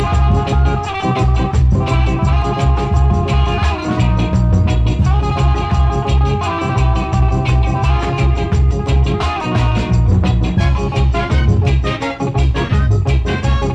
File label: reggae